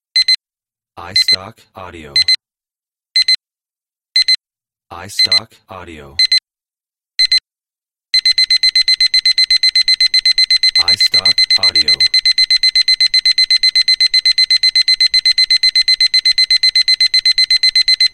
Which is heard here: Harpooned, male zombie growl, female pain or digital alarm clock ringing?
digital alarm clock ringing